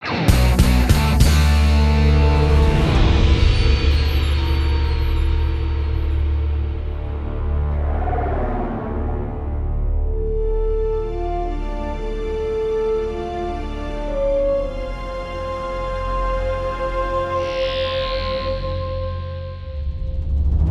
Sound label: Mainmenu Intro; marvel super hero squad the infinity gauntlet 3ds gamerip 2011